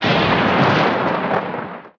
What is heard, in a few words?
lightning